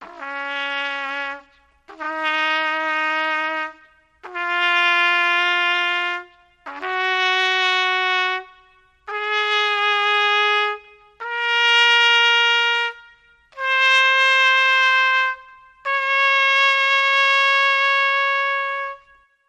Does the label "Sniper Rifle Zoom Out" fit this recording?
No